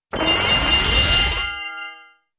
Boxing Bell Type Ring 5x with Ascending, Low-pitched Synth Tones ( I.e. Win Signal Or Alarm ).; chimes & bells